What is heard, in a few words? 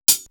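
Hi-hat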